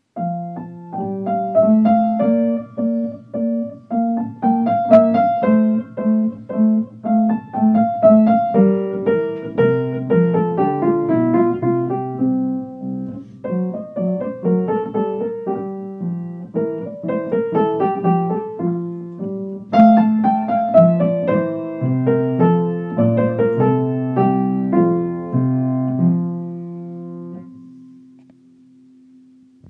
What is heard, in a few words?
menuet grade 1